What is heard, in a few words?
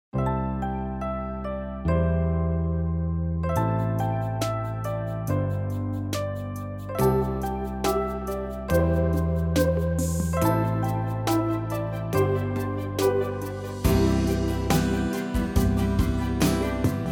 Ballad